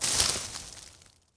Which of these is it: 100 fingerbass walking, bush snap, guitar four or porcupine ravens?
bush snap